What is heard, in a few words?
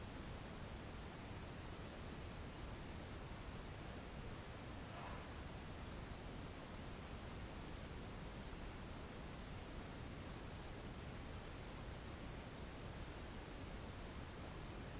Servant Stairwell Static; Irish-Townsend House – Investigation